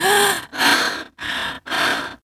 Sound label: Realistic Fatigue Voice, female tempo; oblivion